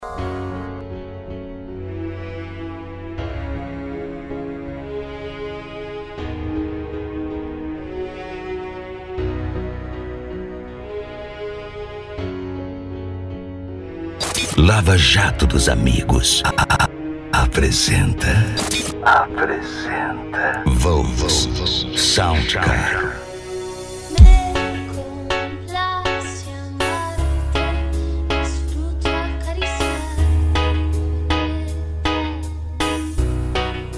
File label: sound car reggaeton; cd volks sound car